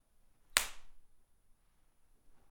Slap

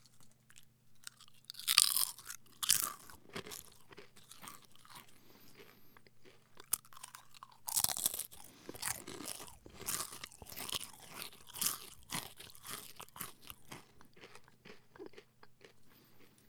Crunch Eat Chips

